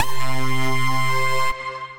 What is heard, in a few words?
Chipped, Synth Lead